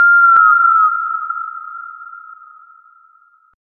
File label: Notification